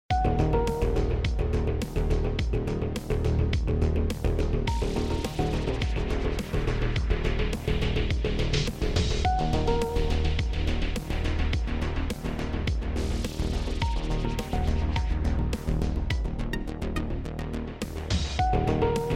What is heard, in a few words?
music; lilu space